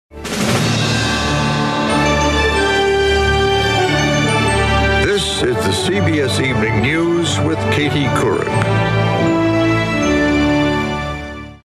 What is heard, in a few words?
News Intro; CBS News